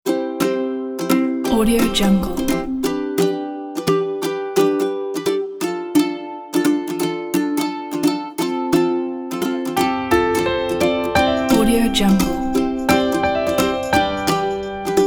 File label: beautiful moments